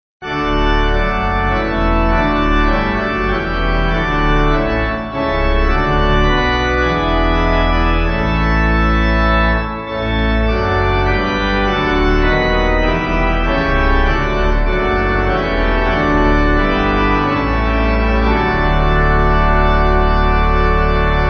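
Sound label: Pipe Organ; In Christ There Is No East; St Bernard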